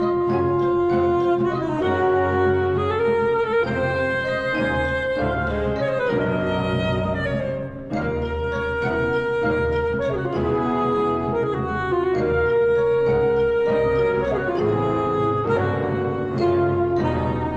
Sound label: fantasia for alto saxophone and orchestra